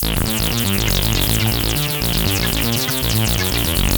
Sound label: Arp, Keyboards